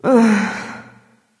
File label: "ugh"; smite; voicepack; nike